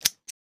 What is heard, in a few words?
lock; CC0 RPG SFX